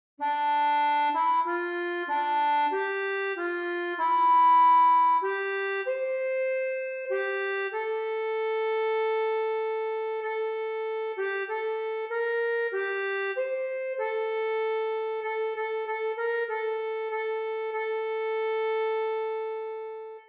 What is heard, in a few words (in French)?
KYRIE ACCORDEON; St Jean